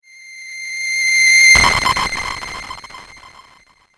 Sonar Locator SciFi Warfare Distortion Blast, Submarine Sonar Ping Distortion Blast; Pings; Marine Technology